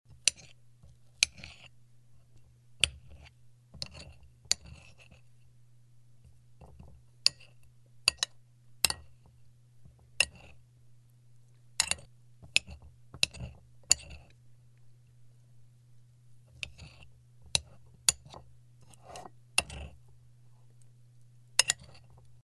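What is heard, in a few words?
sounds of table plates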